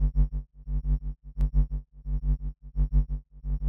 Rythmic Noise 130BPM

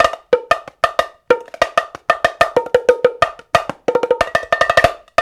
93 TONG, Bongo&Tonga; Spectrasonics; Supreme Beats; African Contemporary

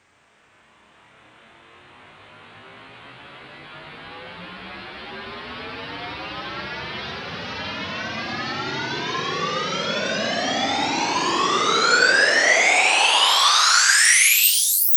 FX Sweep Up; Vengeance - Essential Clubsounds Vol.3; Sweeps Up

